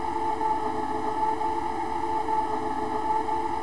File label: Motor, Train; OpenBVE